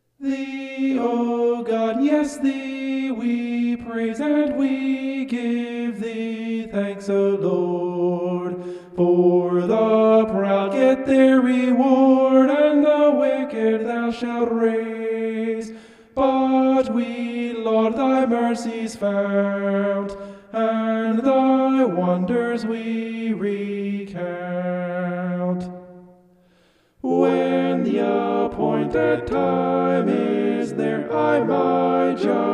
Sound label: vocal homoph; psalm 75